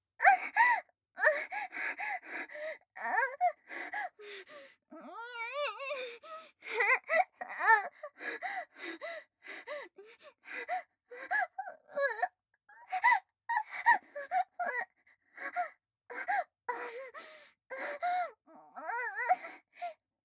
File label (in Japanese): 完堕ち 喘ぎ; アイリス